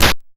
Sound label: Snare, YM, CHIPSHOP HITS DRUMS; ChipShop Deluxe